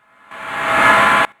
REV GONG; BEAT2000

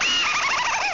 ahoohoo

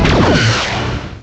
mini laser; ParadroidII

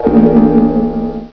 GONG

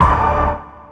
ORC SHOT; BEAT2000

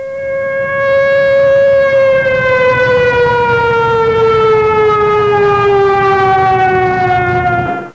siren; warbirds

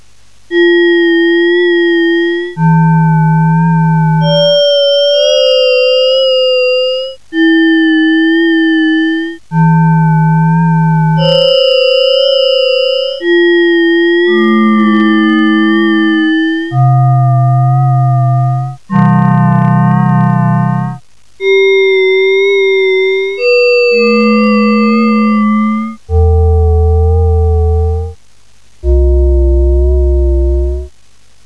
EERIE, SPACE

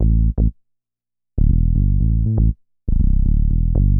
BASS, DANCE; MAGIX

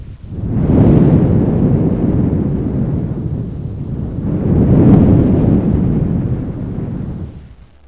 THUNDER

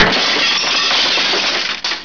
BREAKING

